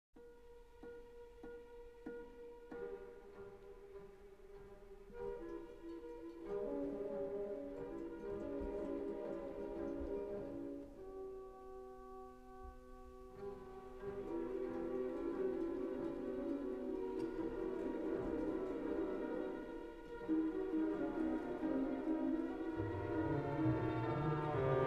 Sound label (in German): Variationen fuer Orchester, Introduktion, Maessig, ruhig; Arnold Schoenberg